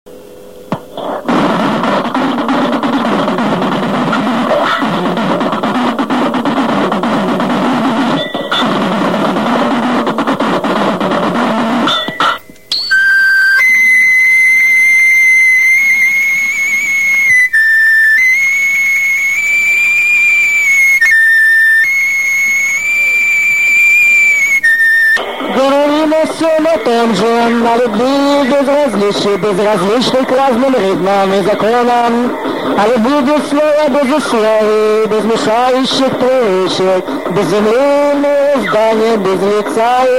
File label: instrumental; thrash avantgarde